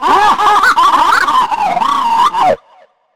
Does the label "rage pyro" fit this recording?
Yes